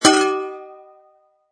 Impact Pot, Cooking pot hit, metal can impact with slight rattle; Materials